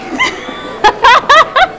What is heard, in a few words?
LAUGH